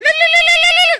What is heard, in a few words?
lu lu lu whatsapp